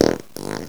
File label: fart